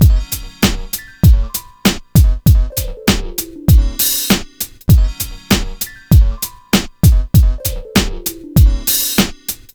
drm G, Hip Hop & RnB; U know How We Do

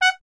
trumpet, F#2; Miroslav Vitous; Solo Instruments VOL-1

